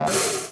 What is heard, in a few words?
tumble ring attack